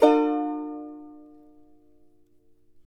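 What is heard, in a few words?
charango E minor up; Spectrasonics; Hans Zimmer Guitars VOL-2; CHARANGO CHD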